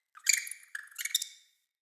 Messenger Rat Chatter A; Ambient; Vocals; Verminia; Animals

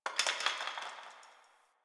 Brick Crumble

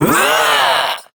Throw, Barks; Kuva Lich; Persona C; Ability

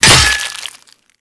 Axe Impact Flesh; Warframe; Weapons; Impacts

